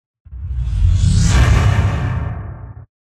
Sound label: Whoosh